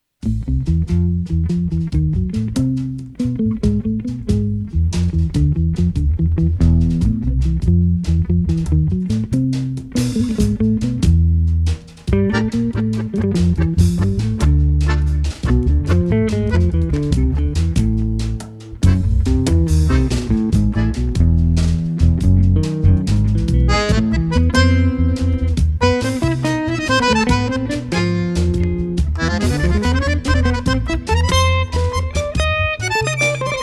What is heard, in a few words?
tango gitan